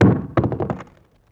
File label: Dull Wood Hit Bounce; Vintage Cartoon; Drums & Percussion